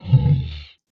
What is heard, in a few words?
zombie pig war cry; mobs; mobs mc